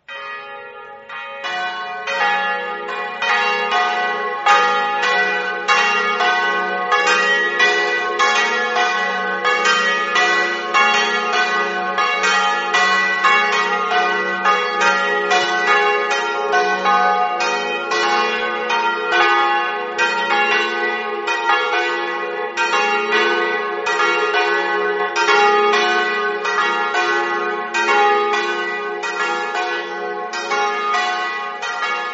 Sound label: campane